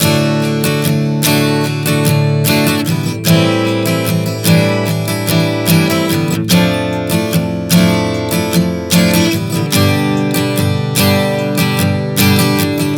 Progression 074 D-A-Fmaj13-G, Acoustic Guitar, Chord Progressions 074 bpm; Sonic Foundry; Songwriter Acoustic Guitar Companion